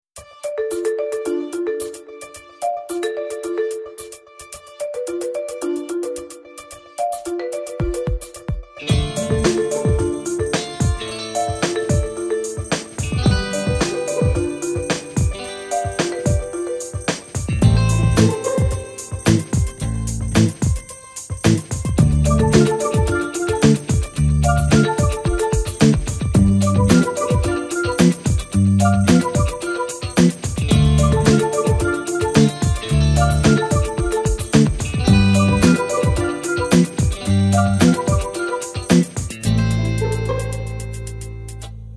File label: Funky Bounces